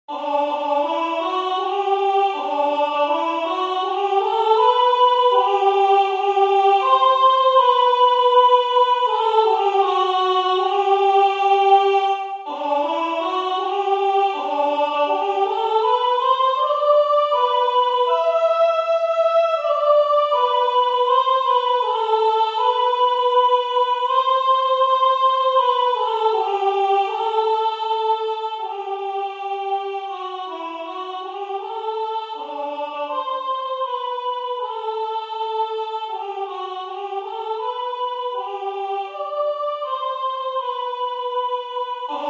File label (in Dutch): wees gegroet maria sopraan